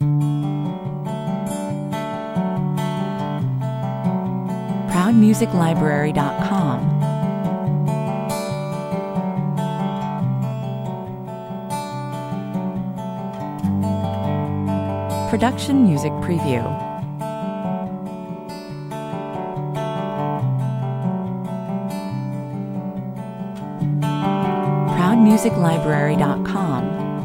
Atmospheric Roots / country ballad featuring a Dobro top line, 142 bpm; Underscore version without the lead melody